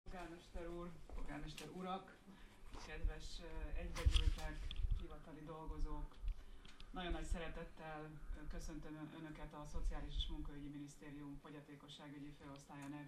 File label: digitar